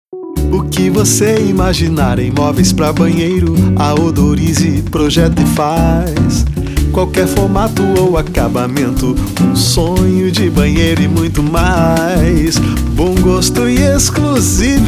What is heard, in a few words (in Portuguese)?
Jingle; Odorize